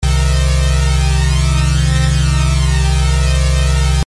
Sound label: Flanger, the flanger effect uses an LFO to modulate the delay time of a duplicated signal, creating a sweeping, jet-like sound.; low frequency oscillator